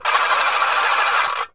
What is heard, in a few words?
Coffee Grinder: Manual Machine Spin.